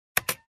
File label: Space bar press